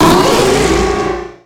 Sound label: pokemon cry